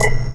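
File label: Subtle HH, hi-hat; Sonic Foundry (Sony Creative Software); Esoterik Beatz; Subtle Kit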